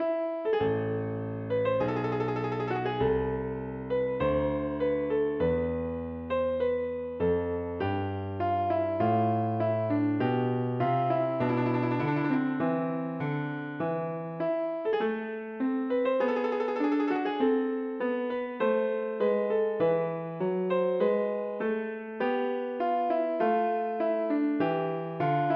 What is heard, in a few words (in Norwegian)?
Galiard for piano - impro; Aamodt